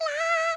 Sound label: sing; Mission To McDonaldLand